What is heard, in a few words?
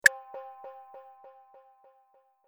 Button Exit; Run-and-capture; Sound Pack 01.09